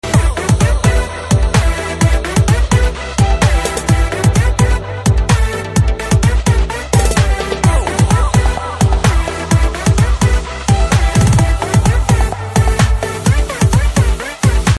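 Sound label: background music Ringtone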